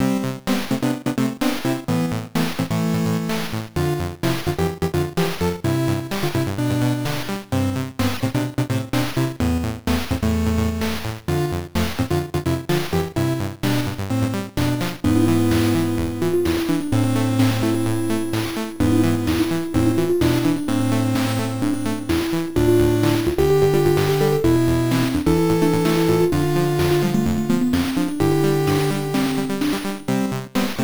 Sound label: Scenario Clear; double dungeons turbografx 16